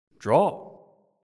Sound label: draw